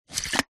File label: cafetiere plunger lift fast coffee inside; household; zapsplat